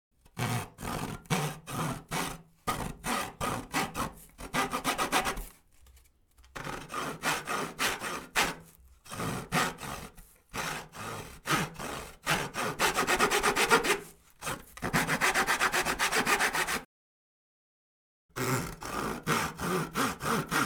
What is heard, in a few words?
Sawing Wood